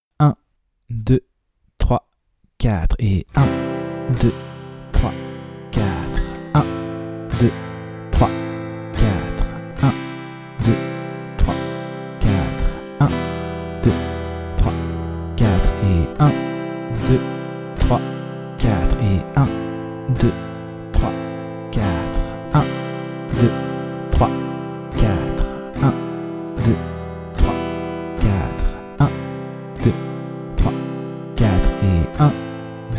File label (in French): moyen travailler la nouvelle grille avec la première rythmique; De 0 à vos premières suites d'accords